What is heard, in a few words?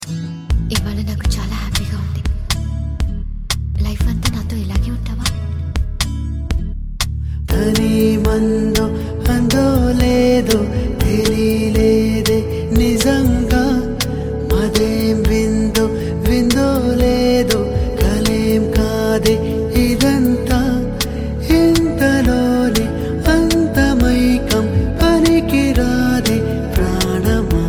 cool Tone